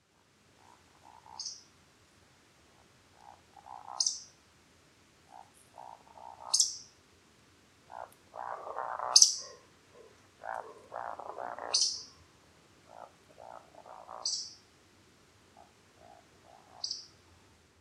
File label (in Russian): valdshnep scolopax rusticola, zvuki nochnyh ptits